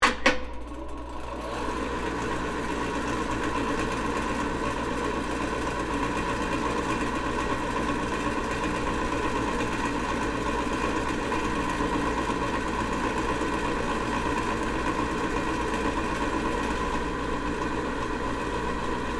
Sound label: Hand Dryer Bathroom, Hand, Dryer, Public, Bathroom, On, Run, Off; Office; BlastWave